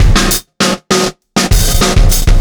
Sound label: Beat, Processed Drumkits; Event Horizon